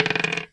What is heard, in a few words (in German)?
Holzwürfel auf Holztisch